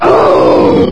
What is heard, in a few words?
ghost alert; svencoop; custom monsters